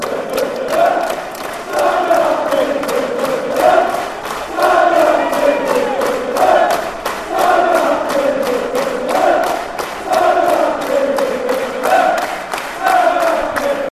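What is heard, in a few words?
fanchants, football chants; de de de de carlisle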